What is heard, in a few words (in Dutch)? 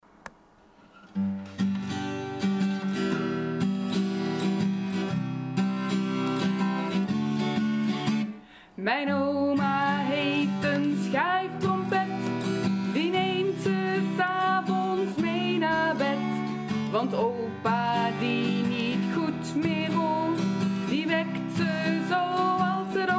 schuiftrompet; oma's schuiftrompet; kinderboekenweek 2016